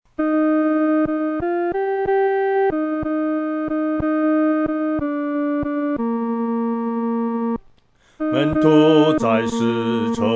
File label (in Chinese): 独唱 第二声; 靠信心得胜